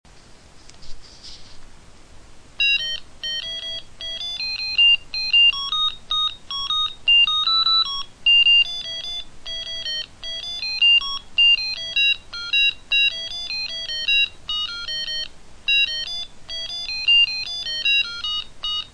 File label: Good Blues